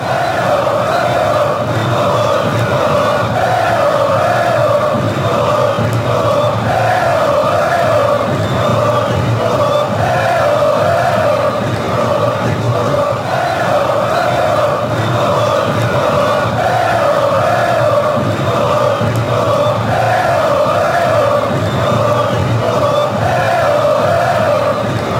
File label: eh oh eh oh tricolor tricolor, chants